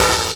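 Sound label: SD -R, snare drum, Drum & Bass; Ueberschall; Drum & Bass Resonance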